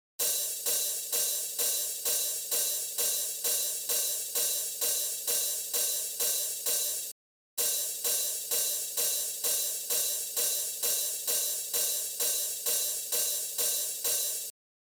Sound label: hihat original